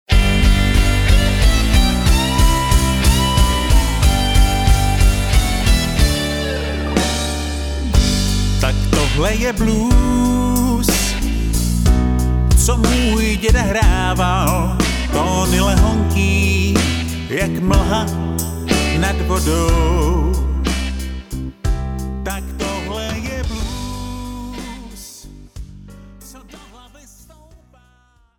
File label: Blues